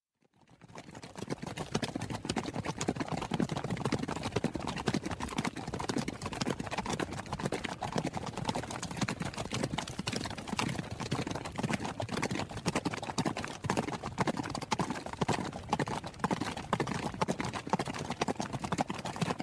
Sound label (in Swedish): hästgalopp